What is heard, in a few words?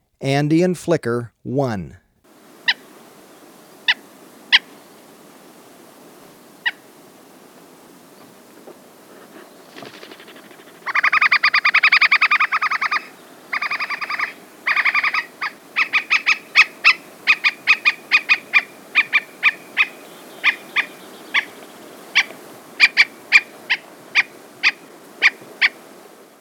Andean Flicker, Birds of Ecuador; S. America